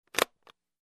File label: Suitcase close click